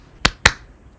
clap

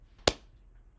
clap

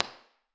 Livingroom; MIT environmental impulse responses

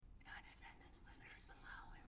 People whispering

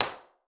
Bedroom; MIT environmental impulse responses

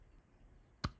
clap

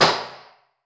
Bathroom; MIT environmental impulse responses

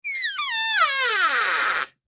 Door creaking

